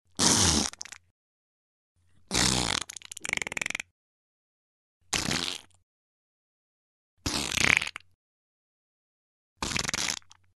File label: fart sound